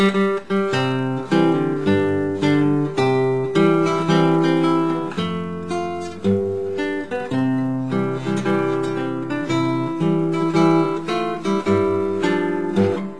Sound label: La Marsellaise French National Anthem. Classical Guitar